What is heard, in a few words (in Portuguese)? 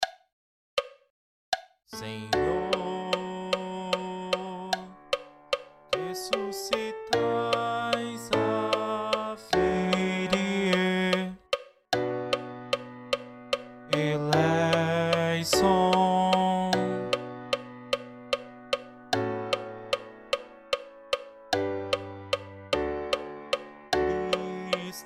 Ato pentencial Coro; formulario 1